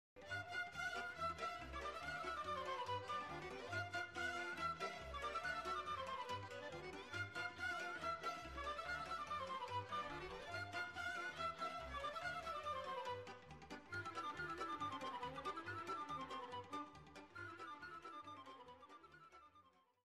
Hora - Roumanie

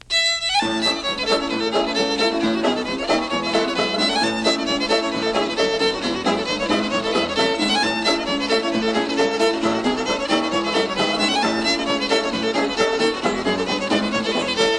Reel - Canada

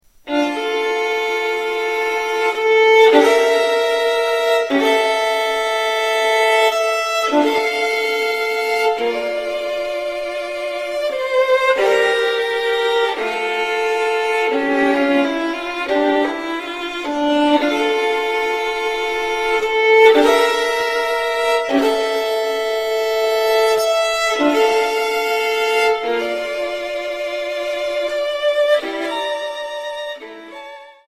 instruments alto

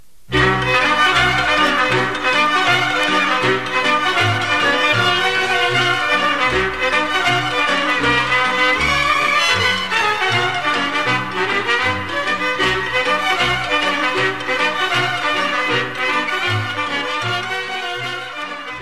Hora - Roumanie

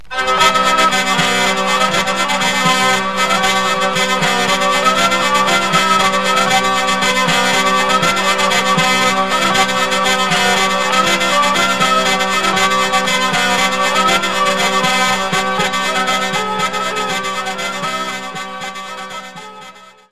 Bourree Droite - France - Berry

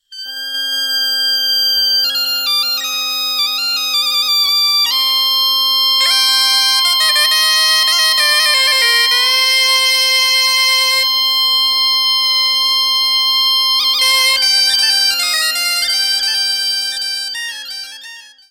Marche bretonne, France

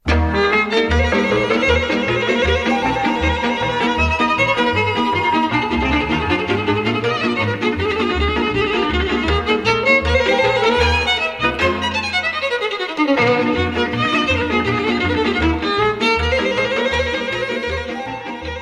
Hora Staccato - Roumanie

